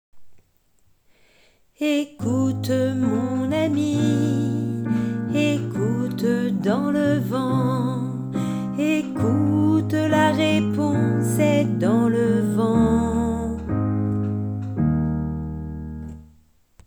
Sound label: alto